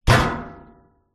household old fashioned top loader washing machine lid down